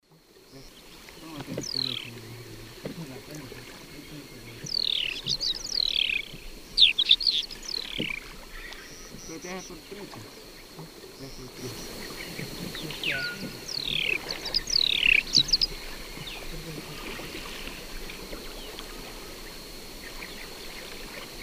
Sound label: Progne tapera, flight calls; S. America; Eastern Lowlands